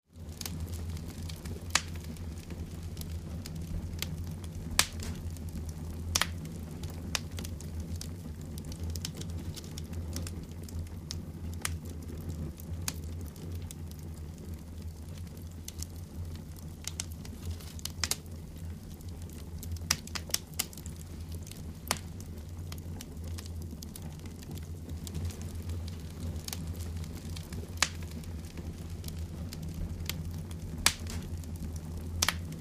campfire crackle

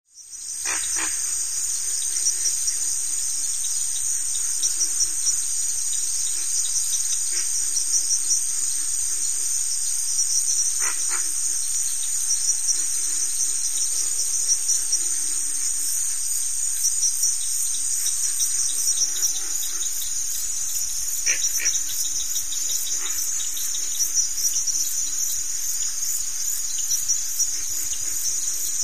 Cricket Ratchet Cont, crickets

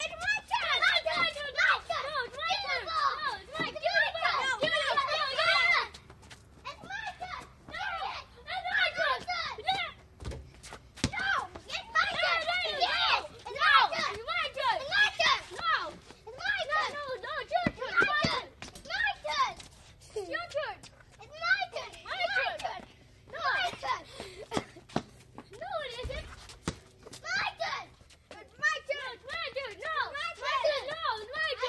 Kids Argue Its My Turn, kids play

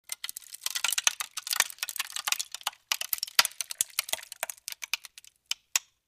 Ice Cracking Small Cr

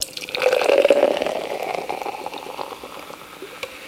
Beer Pouring Glass, eating & drinking

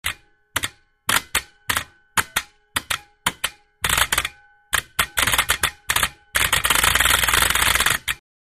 Relay Electric Clan, buzz

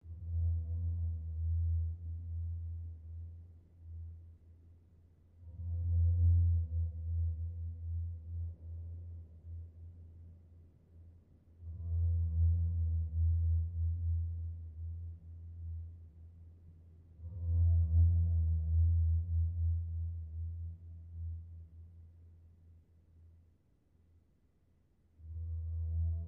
Fog Horn Musical Low; fog horns

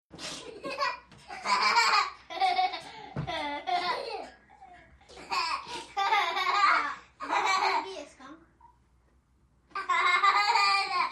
5 Yr Olds Laughing Pla

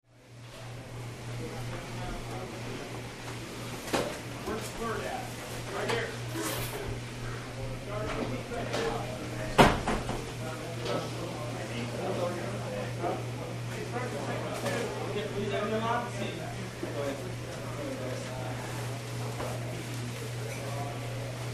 Locker Room Male Wal, locker room sports

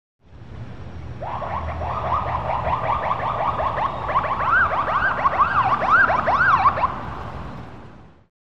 Siren Whooper Short

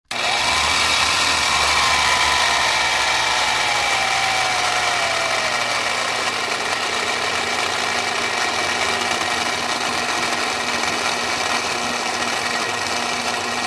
Air Pump Electric; variable speed

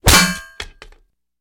Bullet Impacts Metal

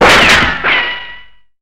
Metal Ricochets Bull

